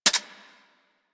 Braton Vandal Redux Fire Mech; Warframe; Weapons

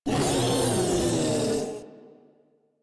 Vocal Attack; Warframe; Proto Infested Ancient

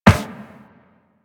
Run Metal Perforated, Footsteps; Warframe; Operator

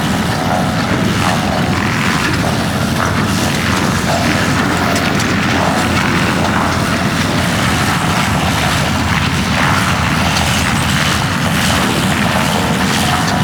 Launch Pad Generator Machine Loop; Ambience; Props; Warframe; Corpus Railjack

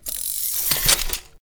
Sticky Bomb Reload Ready; Grineer; Veilbreaker

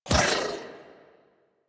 Dodge Impact; Warframe; Pacifist

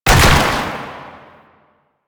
Ranged Weapon Fire; Warframe; Necramech

